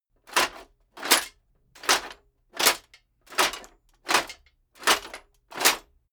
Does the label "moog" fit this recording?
No